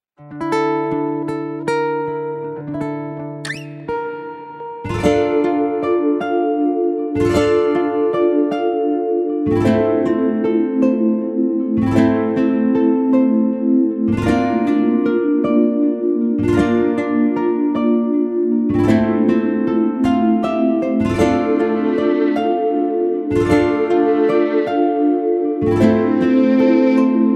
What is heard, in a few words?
Instrumental Version